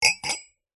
household glass champagne flute set down on hard surface; zapsplat